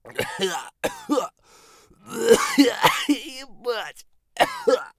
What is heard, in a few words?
agony; Escape from Tarkov